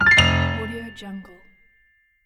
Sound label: piano score